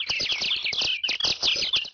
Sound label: knockout; TomCat